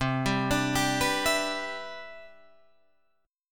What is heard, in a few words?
C Major 9th, Guitar Drop D